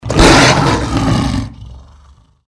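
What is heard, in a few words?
deathclaw power attack; npc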